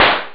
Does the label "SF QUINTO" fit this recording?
No